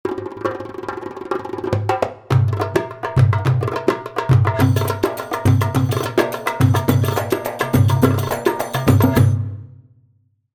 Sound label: Rhumba Masri 105, darbuka